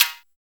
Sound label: ASIATIC PERC; TEK PERC1; Drums In Your FACE!